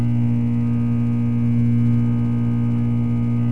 power light; The Elder Scrolls III Morrowind GOTY; XBOX

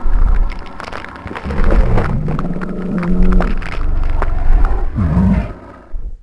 Spino swallow, Dino

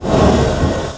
slide metal grating

